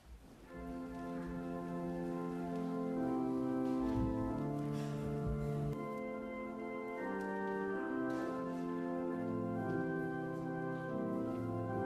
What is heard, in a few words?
hymn